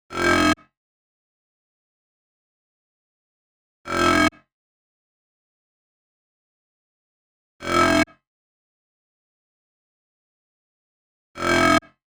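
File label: 128BPM Talk Bass, F minor; Vengeance - Essential Clubsounds Vol.5; Mainstage Kit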